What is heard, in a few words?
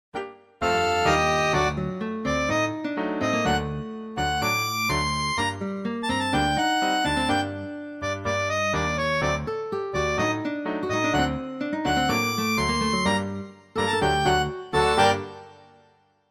Siemens c55, tango